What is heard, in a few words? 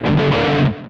Electric Guitar